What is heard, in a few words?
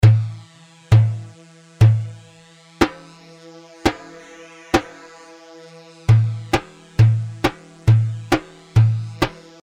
Merjaf; Merjaf 2 مرجاف